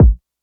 kick latin clean

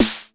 snare one shot cheesy; Snares

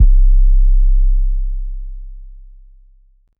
808 C

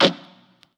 SNR, Snares

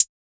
Hat

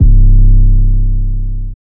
808 C

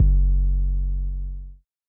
808's

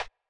Perc

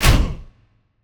Stomp; Classic Toomp

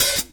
Open Hats

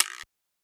Perc

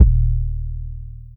Dist 808

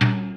Tom, Percs

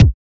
kicks

